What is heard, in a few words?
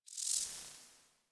UI Theme Grid Open; DE; Warframe; Zephyr